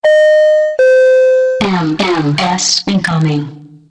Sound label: MMS notification